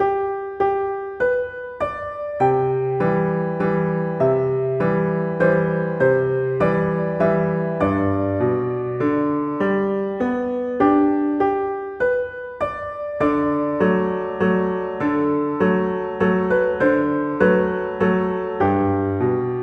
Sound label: on top of old smokey piano